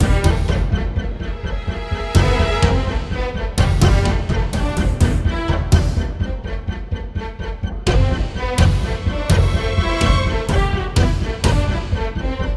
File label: sounds music; Halo Combat Evolved; Xbox